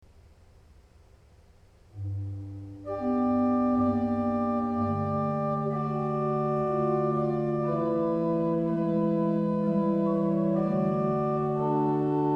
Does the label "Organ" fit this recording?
Yes